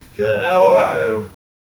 people saying "waah" while

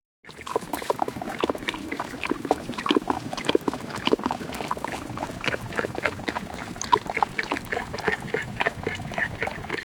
animal

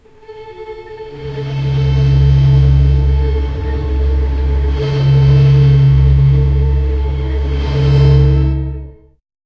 epic rumble and violin

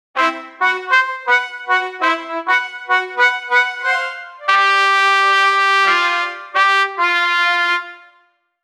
a short cheerful trumpet

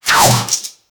a ghost enemy attacks you, combat

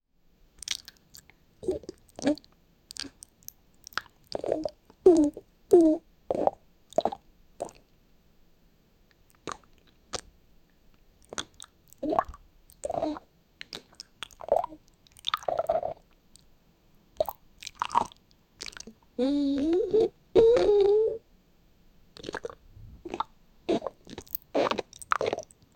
soundscape of fetal sound, bowel

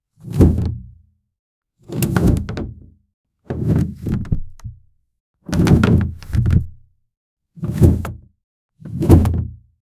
cinematic clean sound of, blunt impact

